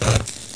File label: light off